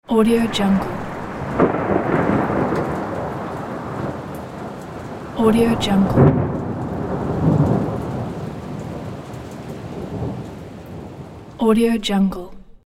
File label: thunder